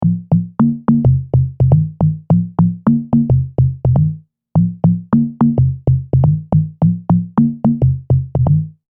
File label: Tonga Conga, Prophet '08